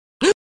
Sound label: Gasps Woman Close Perspective; Human Sounds